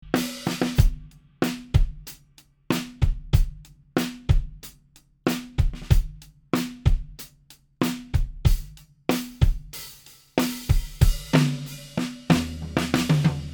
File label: Drums; Glyn Jones Mics; ohne DPA 4060